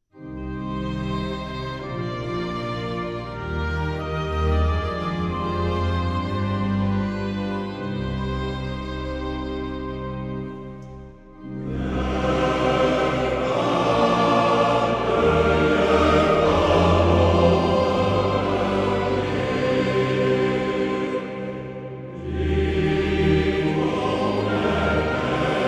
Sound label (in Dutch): Psalm 100, mannenkoor